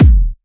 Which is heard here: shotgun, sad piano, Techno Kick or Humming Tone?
Techno Kick